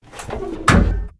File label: Vehicle Hatches; Red Orchestra 41-45